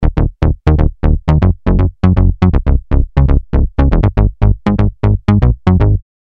SX240 BASS SOLO

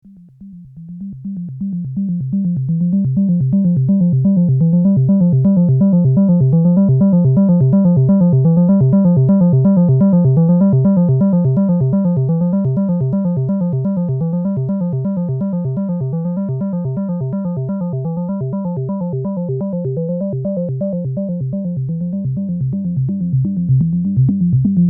Malekko Manther Morph Parameter

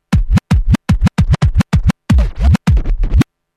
Scratch Bassdrum; Serato

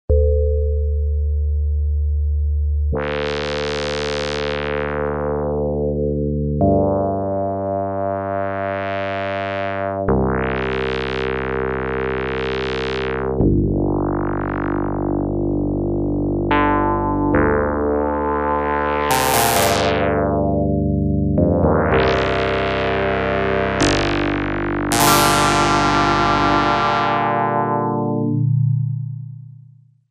LP digital

